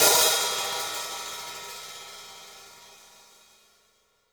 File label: hihat - opened; JazzFunkKit